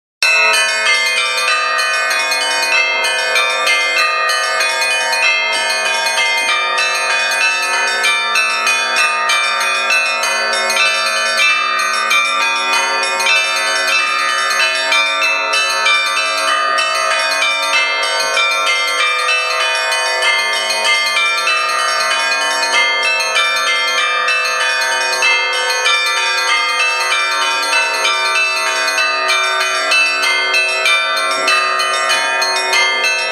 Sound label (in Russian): zvon